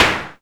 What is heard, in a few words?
Perc Noise Short, Tama TS-206